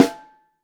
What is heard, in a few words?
SN Top, Snare